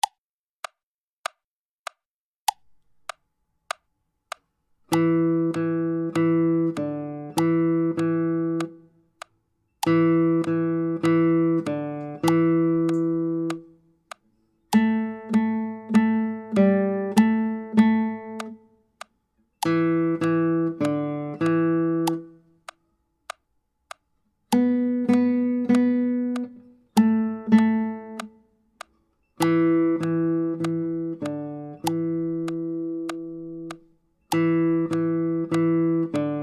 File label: Jungle